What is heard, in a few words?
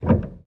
piano release